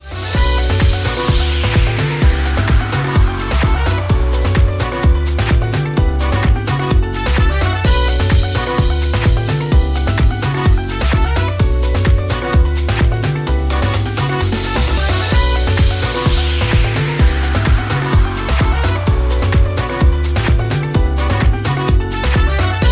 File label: bassline